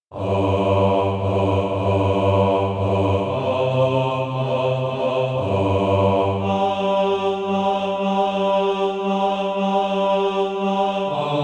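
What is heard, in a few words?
bass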